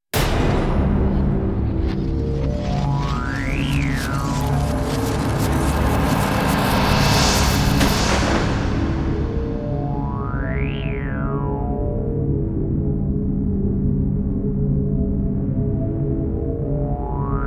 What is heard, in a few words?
Victory; Twisted Metal Black